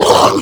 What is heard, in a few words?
Flying Mouth Pain; Warframe; ManInTheWall